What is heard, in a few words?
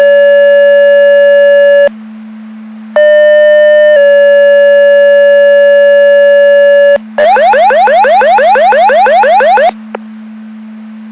Siren; Andover Twp Boro